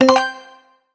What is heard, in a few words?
menu hit; #-K- Elaina [Less Animation]